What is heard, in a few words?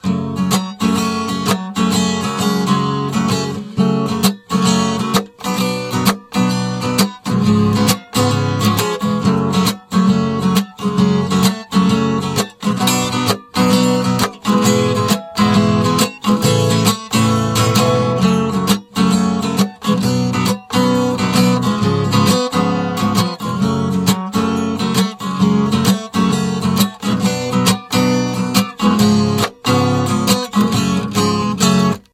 guitar